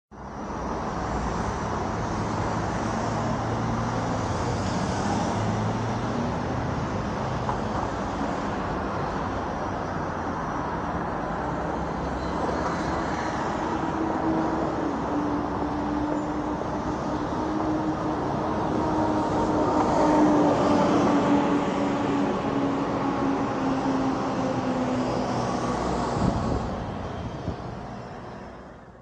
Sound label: Street Noise